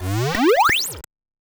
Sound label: level up; cirquit